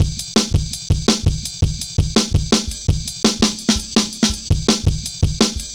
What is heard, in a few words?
Jazz Ride A, Drum Loops, 166.5; Zero-G Jungle Warfare 1, 2 & 3; 90 s Sample CDs